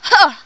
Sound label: Huh; Riviera